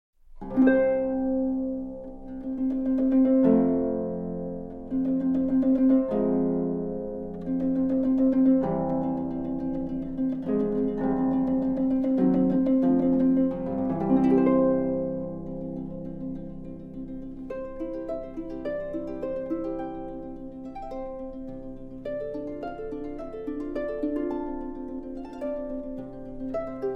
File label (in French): Grande Fantaisie pour Harpe; La Mandoline